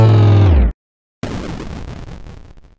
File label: Boom Sound Effects